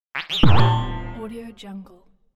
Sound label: cartoon crazy sound